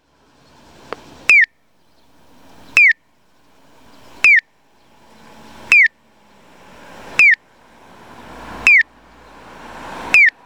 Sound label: piyo; onkyo kosaten; aichi; anjo; anjoshiyakushomae